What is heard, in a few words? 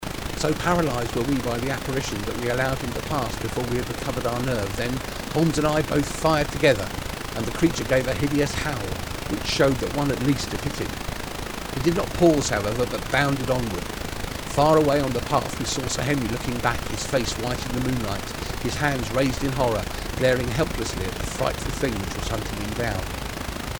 Tinnitus; Hearing Loss Demo